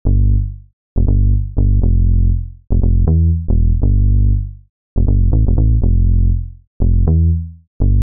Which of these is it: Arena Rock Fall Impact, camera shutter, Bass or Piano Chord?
Bass